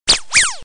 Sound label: Zing zing